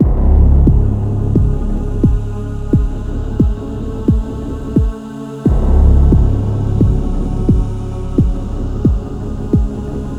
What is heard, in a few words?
Tension Nostrings; Tension Nostrings~alt Loop